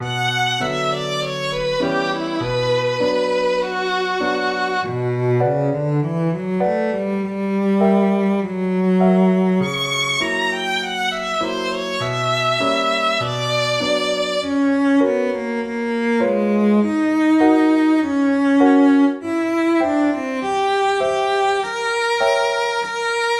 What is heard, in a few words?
Music for conversations; synth